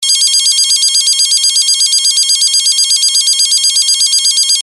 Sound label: alarm